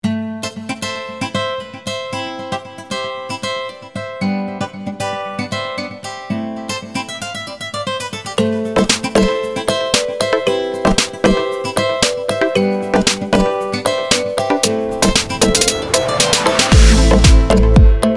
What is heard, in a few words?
Afro trap naylon gtr